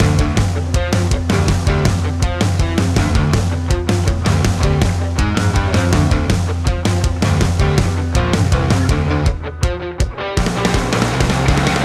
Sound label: Skatepark Intro